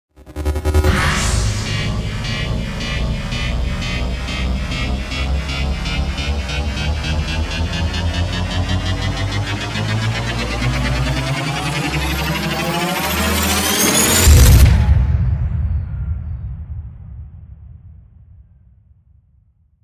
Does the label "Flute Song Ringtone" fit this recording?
No